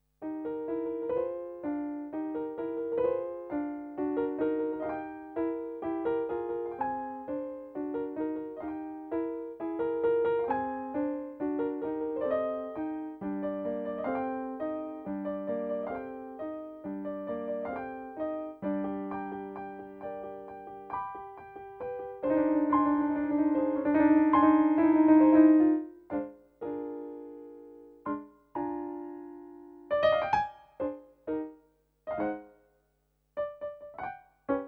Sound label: Piano only